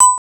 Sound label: single Beep; singleBeep03